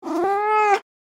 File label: Playful kitten meow cat meowing; animal